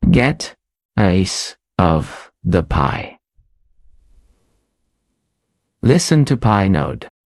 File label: jingle